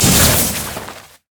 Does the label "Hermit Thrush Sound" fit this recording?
No